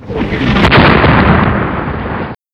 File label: hit